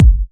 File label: Kicks; Vengeance Samples